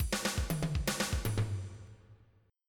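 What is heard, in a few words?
boogie, break; Sounds Terrific II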